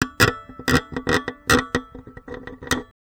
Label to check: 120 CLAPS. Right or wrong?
Wrong